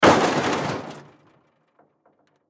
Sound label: Crash Metal Hit Debris Plastic; Sound Effects:Materials; Blastwave